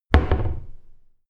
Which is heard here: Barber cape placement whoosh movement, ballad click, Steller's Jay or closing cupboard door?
closing cupboard door